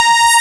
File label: TAURUS A1; E-MU Formula 4000 Series Vol. 3; Analog Odyssey